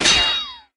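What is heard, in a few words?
Bullet ricochet metal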